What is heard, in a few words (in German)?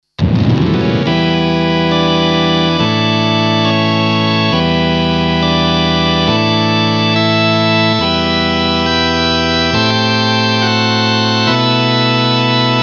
Vox Continental Radio Orgel